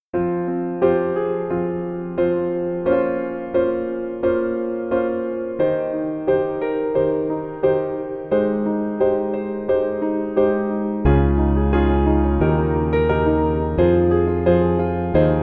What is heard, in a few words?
Piano